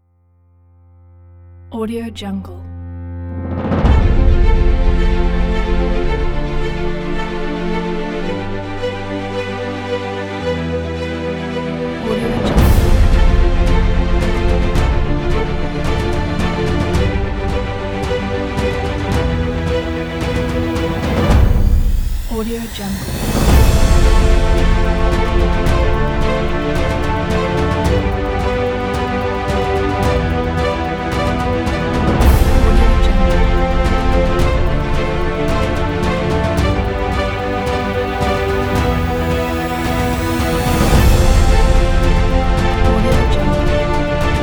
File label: the epicness